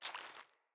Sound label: Boot Walk Water, Footsteps; Enemy; Sinecure; Melee Grunt; Boots